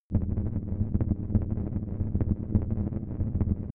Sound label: space background noise rough; weltraum